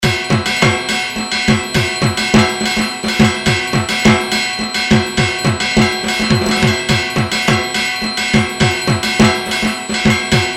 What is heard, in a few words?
dhaak sound